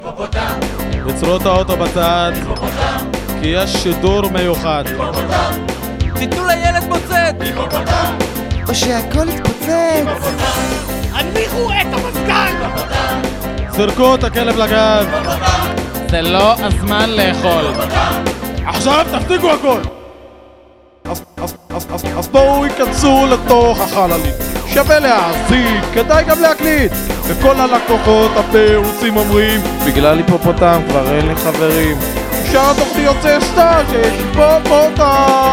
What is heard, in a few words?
opening song; hipo